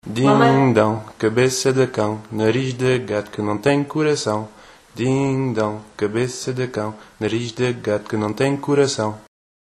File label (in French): Ding donc comptine occitane